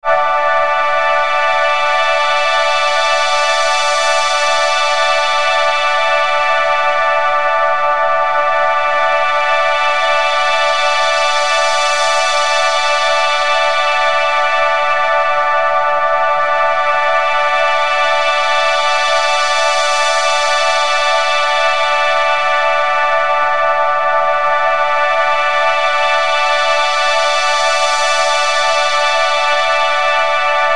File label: morning light pad